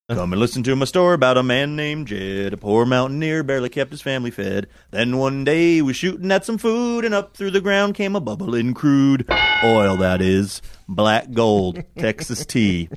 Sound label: singing theme; beverly hillbillies